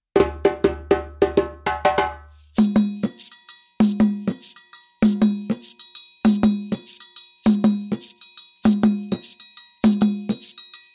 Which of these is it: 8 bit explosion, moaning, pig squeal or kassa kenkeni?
kassa kenkeni